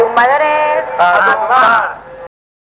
grito guerra

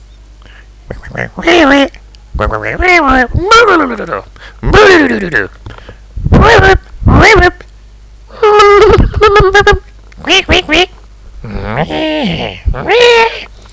cacatua

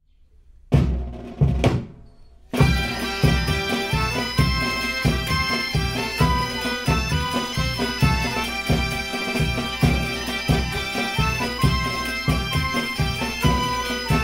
pasodoble de san roque, gaita

